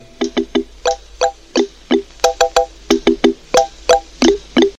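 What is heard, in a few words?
Xylophone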